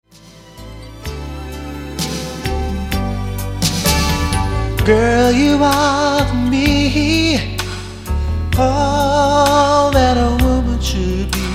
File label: Ballad